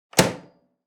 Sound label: Microwave door close; appliances